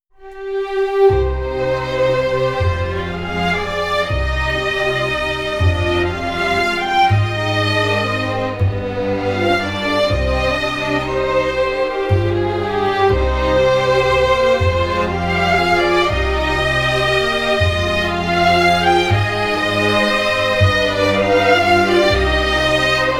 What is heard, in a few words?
Strings Version